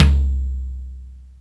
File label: high tom one shot c key